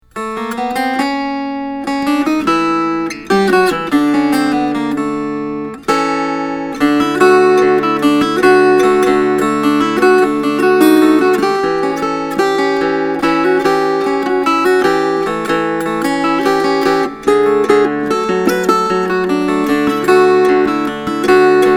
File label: Ragtime Dance